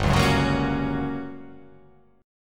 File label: G Minor Major 7th Flat 5th G